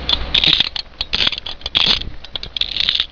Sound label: Meccano Magic Motor Winding